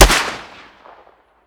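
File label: weapon dmr sup fire player atmo ext; gmod; viper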